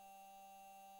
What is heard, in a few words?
mic, mars2020 edlcam